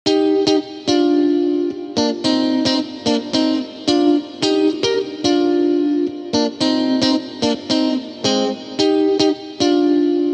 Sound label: Alert